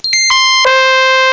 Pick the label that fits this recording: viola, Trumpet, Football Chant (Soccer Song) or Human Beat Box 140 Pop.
Trumpet